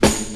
shiny steel snare drum G sharp key

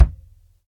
reverb steel kick drum sample b key

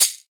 short shaker sound g sharp key; percussion

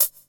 good hat one shot f key

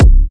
trap kickdrum sample c sharp key

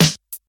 crispy snare one shot f key

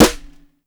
low steel snare drum c sharp key

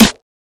2000s subtle reverb snare one shot g sharp key; snare drum samples

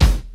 short kick drum G key

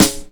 natural acoustic snare sample g sharp key; snare drum samples

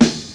good snare drum a sharp key

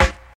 hip hop acoustic snare sample c key; 90s

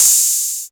muted open hi hat one shot a sharp key

